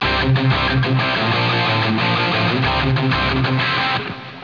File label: fuzz